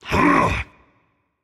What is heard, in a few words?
grunt lift rage; chaac